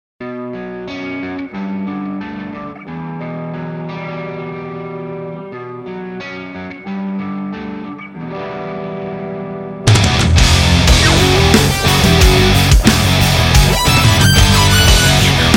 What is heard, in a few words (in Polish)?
skala